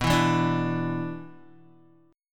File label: B-Minor Double Flat 5th, Guitar; Bmbb5 strummed; JGuitar